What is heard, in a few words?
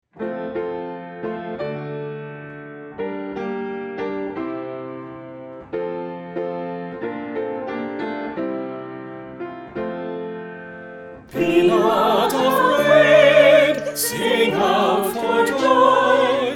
Anthem